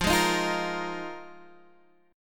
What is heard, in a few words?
A sharp 7th Suspended 4th F, Guitar